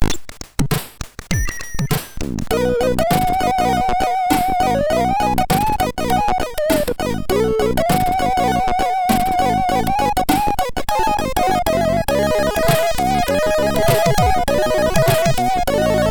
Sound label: Jazz and Weird Tekno, sid MOS6581; hvsc